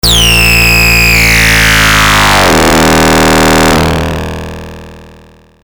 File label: modulation with bender; neptune